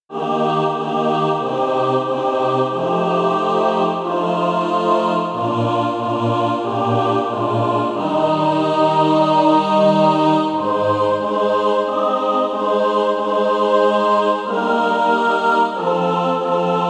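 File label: mixed, SATB, Practise Music; Cross of Christ Lead Onward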